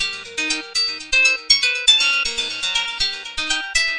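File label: dissonant echo synther, 120 bpm; SYNTH AND ELECTRONIC LOOPS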